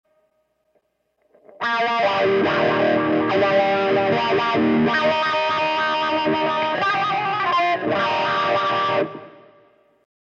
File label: woodsy wah